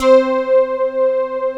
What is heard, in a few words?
PAD, SYN PAD